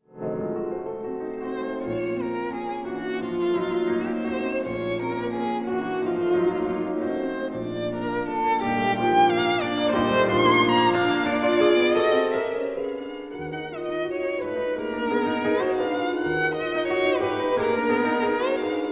sonata for violin or cello and piano, allegretto poco mosso; 4th mvt